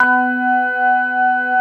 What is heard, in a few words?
CX3 ORG B3, Keyboards Old School; USB Soundscan vol.09 - Keyboards Old School; AKAI